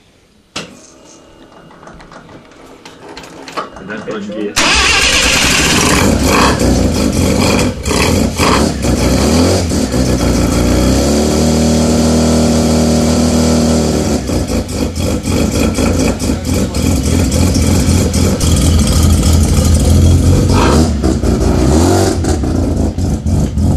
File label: 10a pp idle, 10A peripheral port